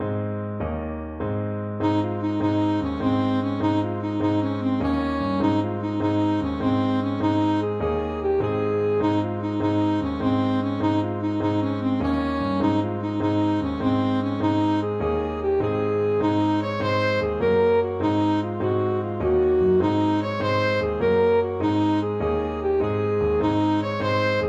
jig ASAX, saxophone

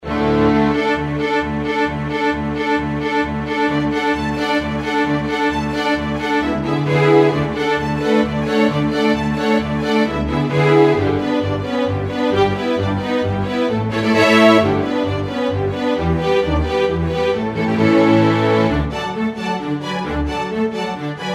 romanian folkdance, string ensemble; bartok

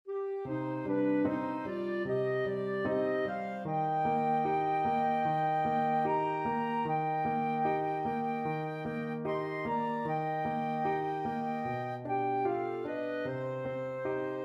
el condor passa, flute clarinet duet

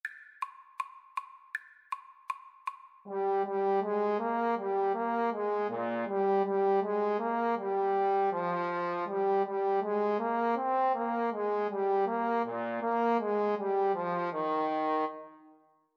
Trumpet Trombone Duet

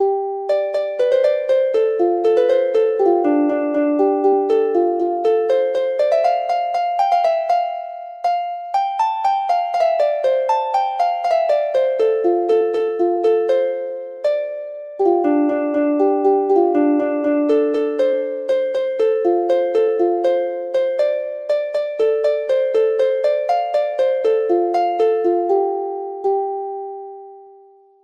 harp

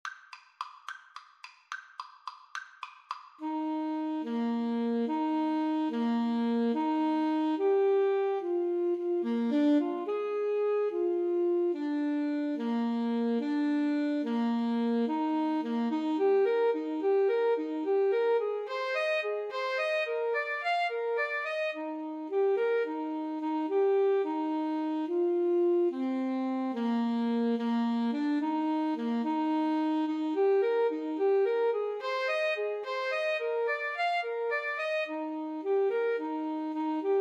clarinet saxophone duet

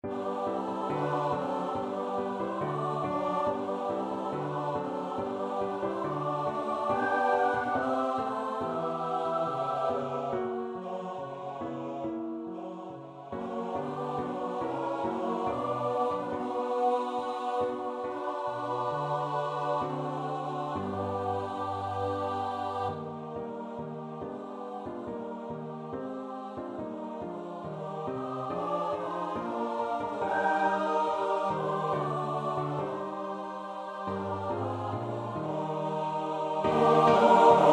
two little wings, choir; parry

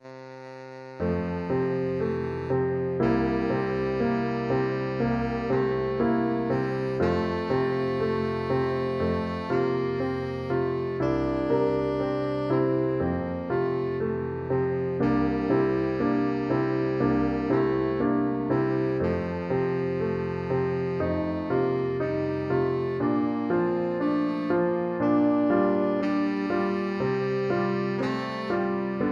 tenor saxophone

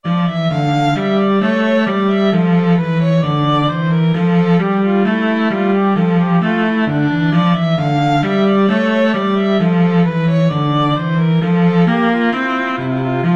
violin cello duet

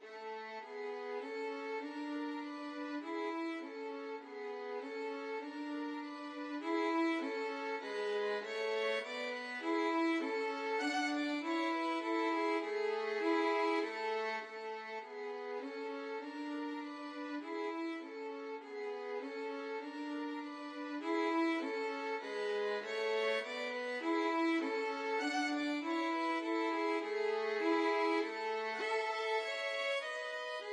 Menuett in C Mozart violin duet

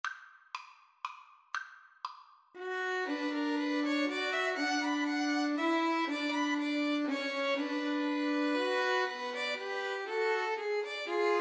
2 Violins Cello; grieg peer gynt

